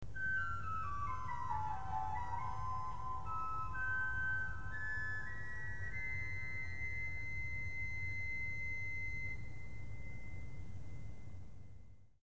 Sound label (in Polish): flet lesny